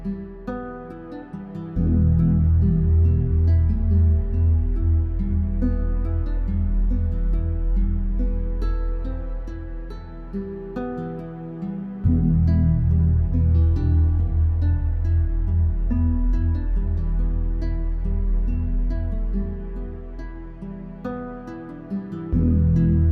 village in despair, A short loop.

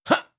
effort grunt male

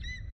ability conjure eagle hit

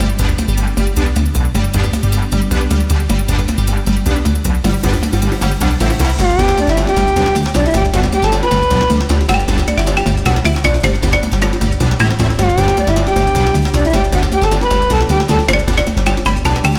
joyful jungle climax bpm155

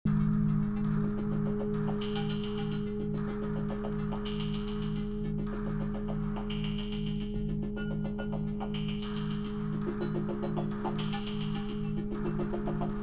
short mysterious futuristic loop sample

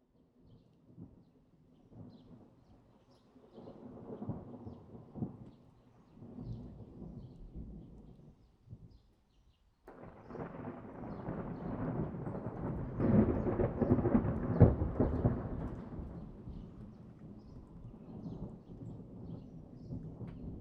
thunder - lightning - ambience field recording

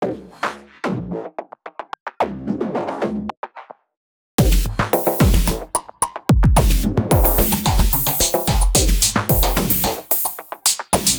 hacking the computer

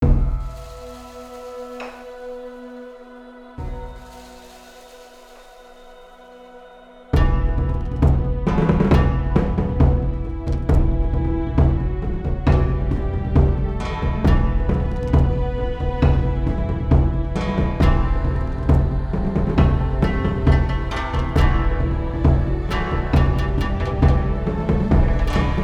drums of dawn; tkucza

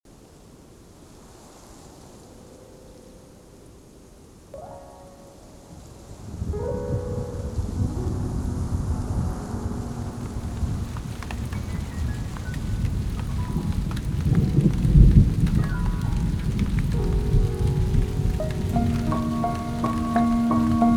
Early Rain

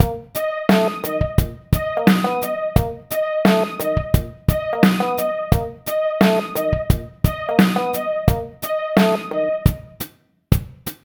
funky loop

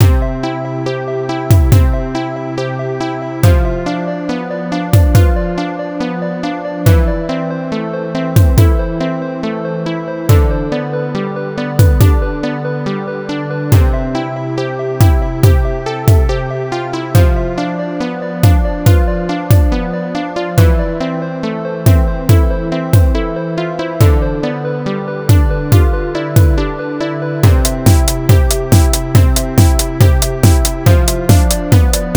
Spinning Synths

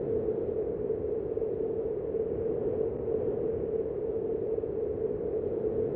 wind woosh loop

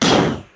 explosions

